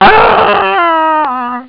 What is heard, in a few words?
KILL